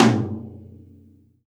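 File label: ambient mid tom, Acoustic kit; Akai MPC2500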